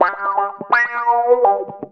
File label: 125 g, guitar licks; Vocal House; PS-28 Vocal House Samples; instrumentloops